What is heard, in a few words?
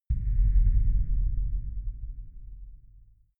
far outer space shadow, hits impacts